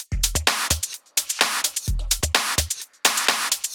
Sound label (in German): Drum Loop 128BPM FULL ohne BD; Vengeance - Essential Clubsounds Vol.5; VEC5 Drumloops; VEC5 Multi Loops 128BPM